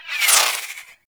Warfan Projectiles Whizzby; Broken Frame

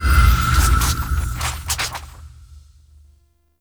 Selected Title B

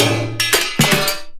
Race Music Ending B; K Drive Races; Fortuna

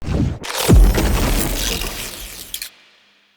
Shock Mote Activate; Motes

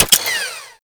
Miter Mech, Weapons; Warframe; Tenno

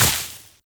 Step Veg, Footsteps, Walk; Warframe; Tenno

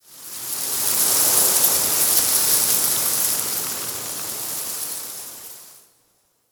Forest Wind Soft Leaf Gust; Warframe; Grineer

